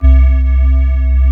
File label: TS 10 GLASS, SYNBELL